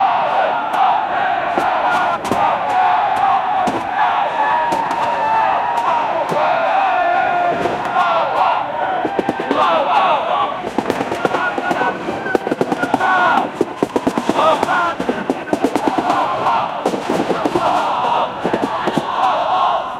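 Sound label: in the background a prussian, historical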